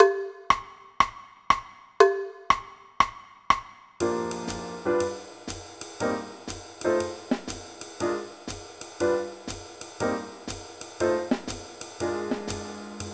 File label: Senior Bass Playalong